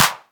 good clap sound G# key; single hits one shots

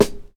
modern deep acoustic snare sound f key; snare drum samples

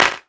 clap sound g key; percussion; single hits one shots

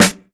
studio snare drum f sharp key; tuned snare samples

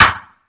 hand clap sample g key; single hits one shots

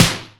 sharp acoustic snare f key; snare drum samples

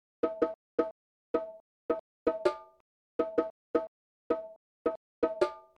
bongos and congas sample; percussion loops; resample

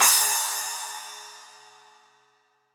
long tail reverb crash cymbal sound a key; cymbal drum samples

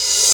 short open hat sound d sharp key

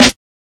old school rich top end snare b key; snare drum samples

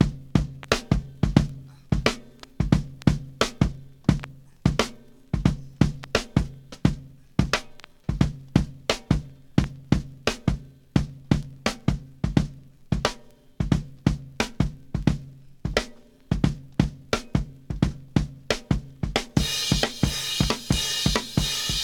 88 bpm hq rock drum groove g key; drum loop

